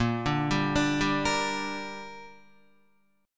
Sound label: Bb 6th, Guitar; arpeggiated; 6,5,5,7,7,5